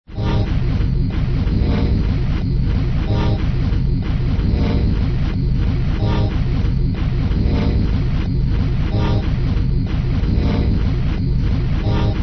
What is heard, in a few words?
organ; kristianpeters